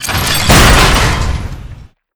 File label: cage die